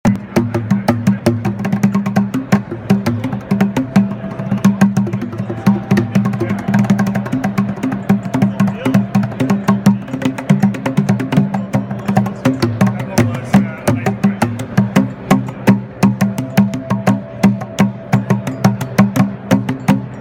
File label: Congas